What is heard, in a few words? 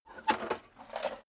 metal plate armor handle